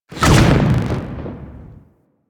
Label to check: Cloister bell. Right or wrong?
Wrong